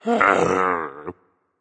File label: sigh berserk; cuchulainn